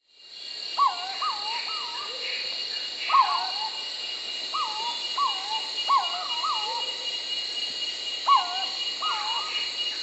Purple-throated Fruitcrow (Querula purpurata), Peru; S. America